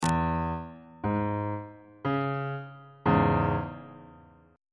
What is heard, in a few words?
d flat minor 1st inversion on bass clef